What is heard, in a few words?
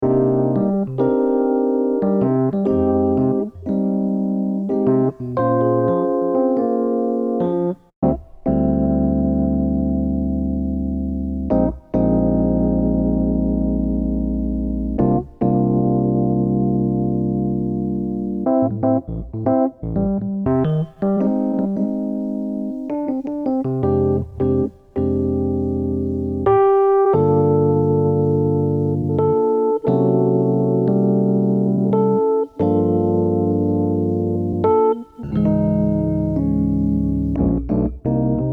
Rhodes; Prunehead